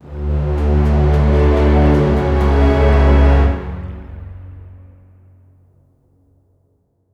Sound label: orchestral suspense hits